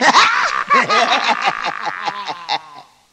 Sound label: laughter evil; ooh ahh